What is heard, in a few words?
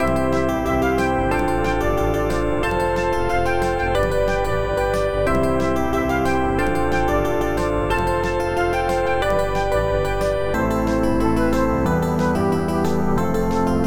Victory; Nicky Boom; DOS